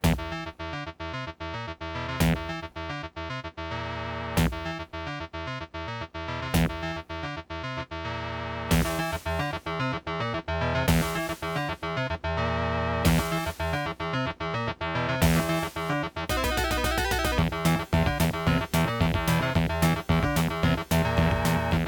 Aztec 2316A

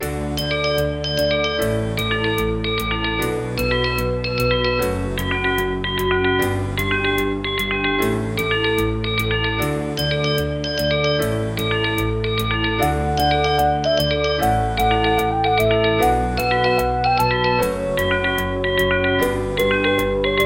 Pinnacle; Lemmings Intro

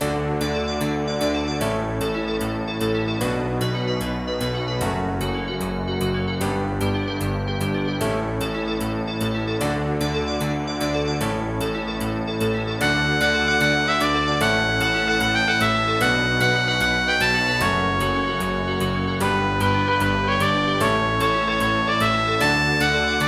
AWE32; Lemmings Level 1

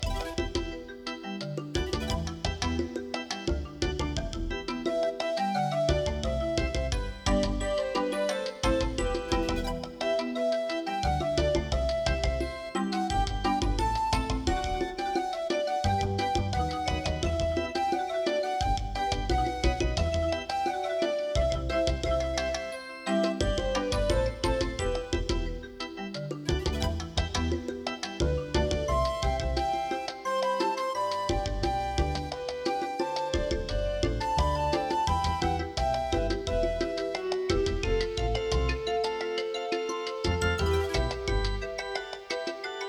AWE32; Monkey Island Intro

